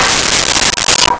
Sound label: Tube Bells G5; Nintendo; July 2020 Gigaleak